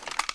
clip tap, weapons

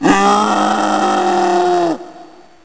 agrunt pain

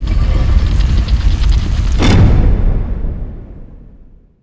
stone slide chunk

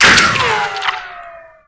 ric, weapons

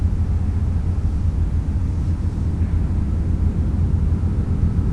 ambience

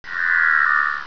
sonar

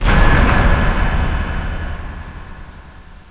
impact

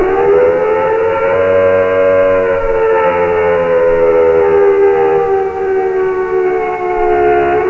air raid siren

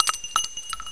m79 shell drop, weapons

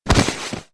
body drop normal; Physics

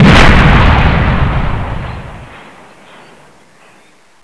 ceil smash

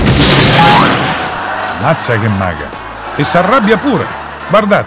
bodydrop

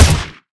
m4a1 fire, weapons

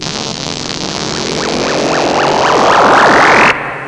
charge, weapons

